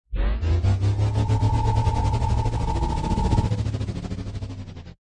Ship Teleporter Spin; Lethal Company

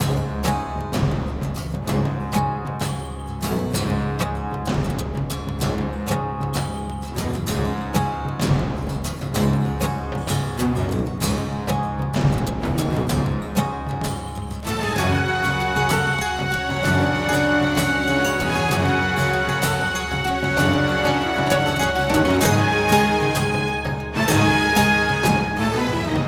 Combat

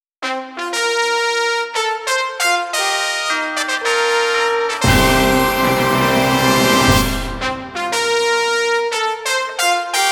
Fanfare London

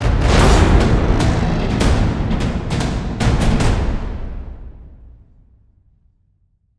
Stinger Fill; extinction deluxe edition; ps4 windows xbox one

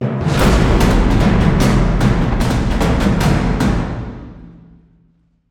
Stinger Fill

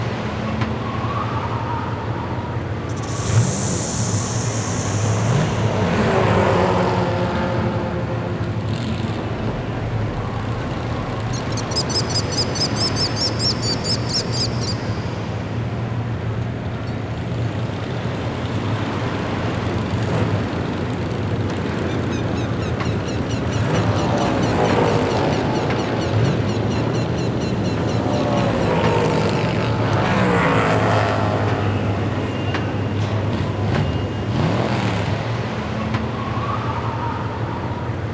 Ambient

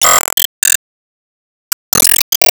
Count Down; Disney Star Smash; Android, iOS, Mobile

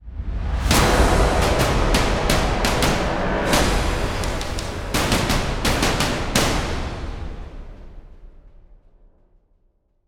Stinger Fill

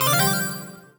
collect item jingle; Strike Force Heroes; Xbox Series X/S

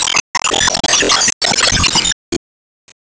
Union Smash Hit

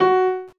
VOC PIANO

